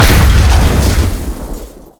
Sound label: Enemies Exploding